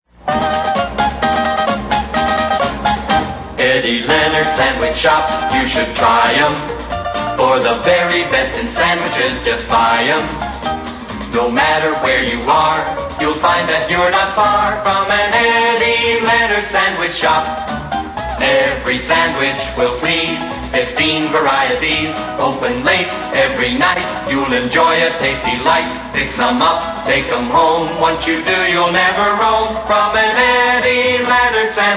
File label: jingle